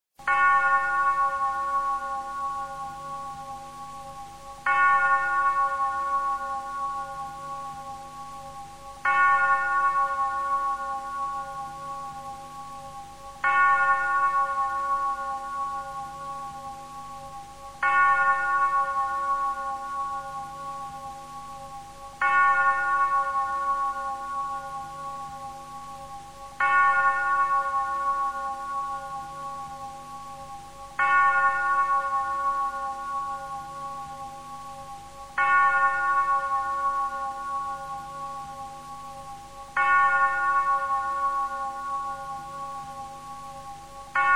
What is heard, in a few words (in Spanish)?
Campanas minuto silencio; Material de divulgacion